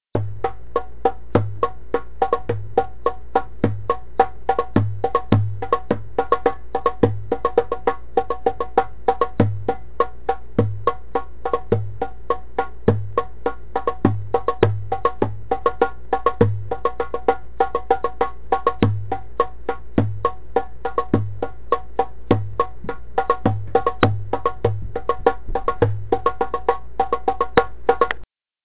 Maksoum Masmoudi

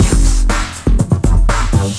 electro

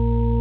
a sharp 2, music keyboard

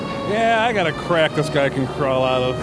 crack crawl

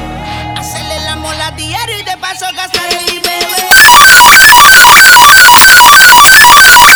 alien

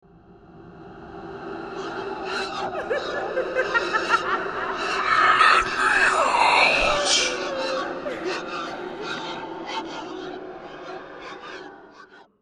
Horror

